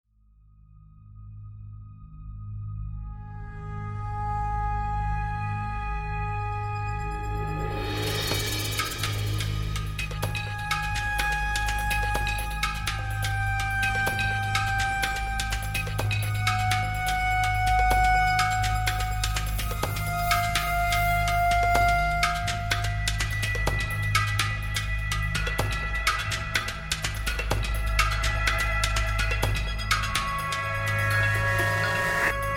Snake Attack

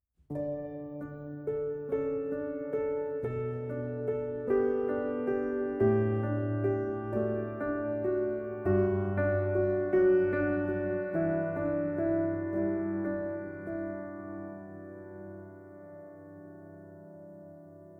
Poetry Of Nature

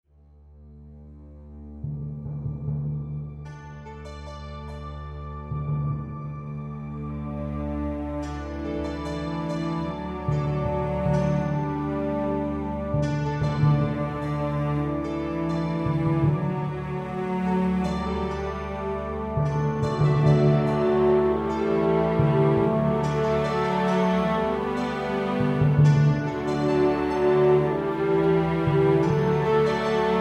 Morning Dawn

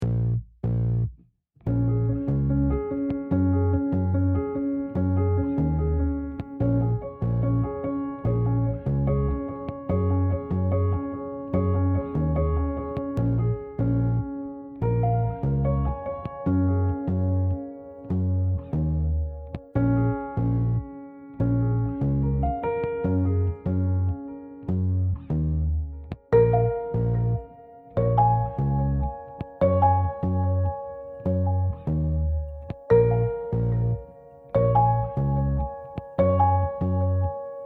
Acoustic Movement reduziert

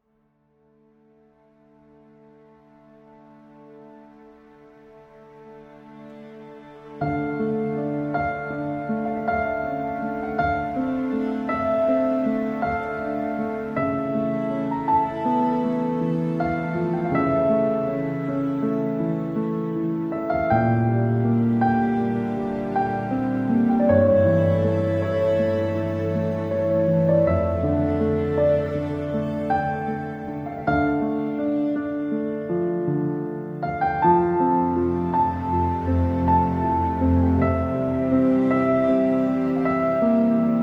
Solitary For Piano

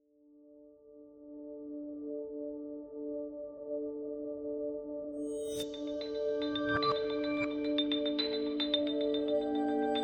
Atmo Bed B with Beat

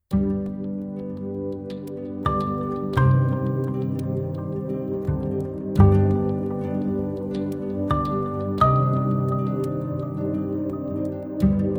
Idyllic Country Life

